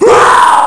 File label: death; quake2; coop